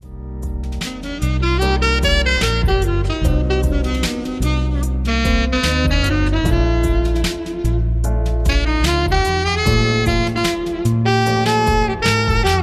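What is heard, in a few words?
jazzy Café Jazz Vibes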